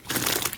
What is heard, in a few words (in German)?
Schleim Split; ludum dare 46; Boss; SlimeBoss